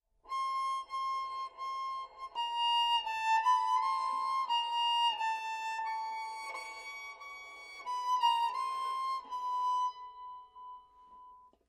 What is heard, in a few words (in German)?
bogen, banjo